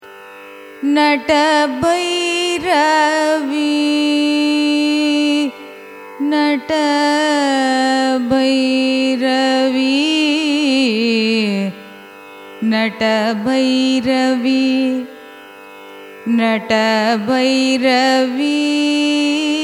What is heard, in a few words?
raga natabhairavi signature, carnatic music